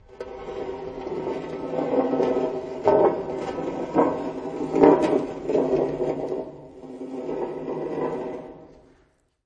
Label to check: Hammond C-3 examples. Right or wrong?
Wrong